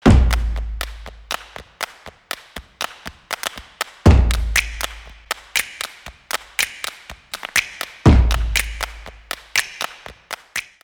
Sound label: Percussion